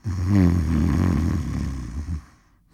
snore

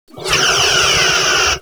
other phaser; ships combat

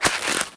arms, wear

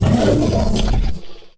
attack; CosmicRage; general combat creatures cylarkan

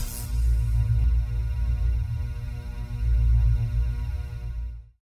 shield recharge

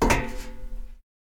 plating; games; CosmicRage

